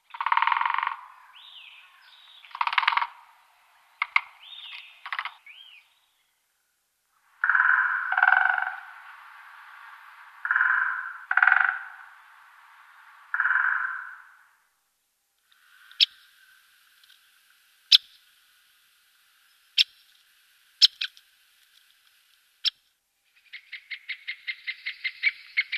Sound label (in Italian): Picchio rosso maggiore, the woodpecker